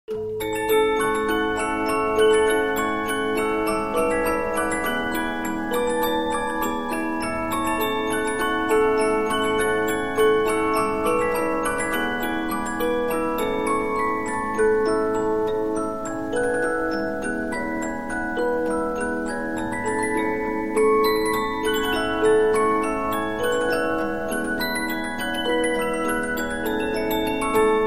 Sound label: Polyphon, 15inch disc musicbox